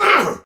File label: Attack Effort; Warframe; Tau; Dax; Ashigaru